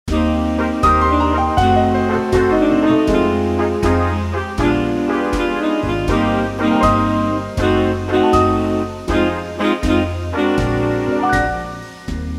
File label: Big Band; Wersi